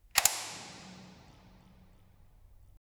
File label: floodlight turning on wit, lighting